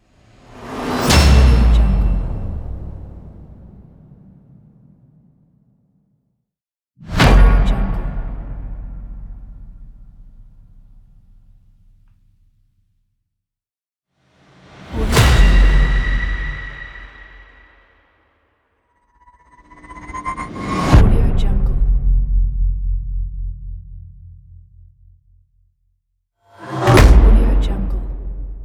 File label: trailer sound effects pack